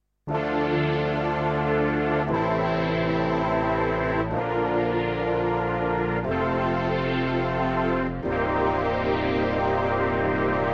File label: Korg 05RW; Korg 05R/W Synthesizer module; NoHair; a sound from my set of Genesis patches